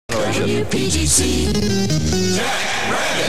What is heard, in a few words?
jock jingles; jack rabbit